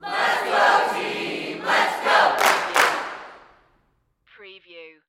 Sports Crowd Chant Cheerleaders Lets Go Team Lets Go With Clap Single